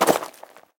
ledge land; Golden Crayon Sounds

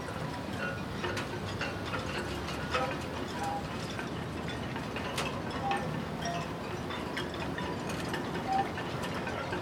harbor; Golden Crayon Sounds

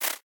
skip; ChromeVox Classic Sounds

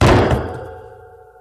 steel fall; Golden Crayon Sounds

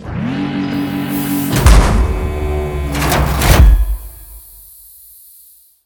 tank unspawn; Original TK Sounds

